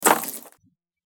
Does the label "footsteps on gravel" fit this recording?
Yes